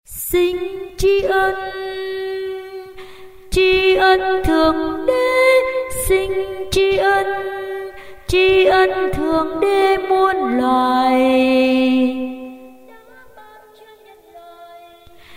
basso

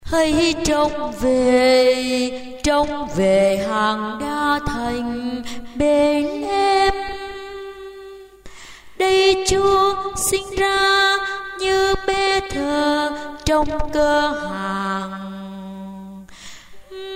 basso

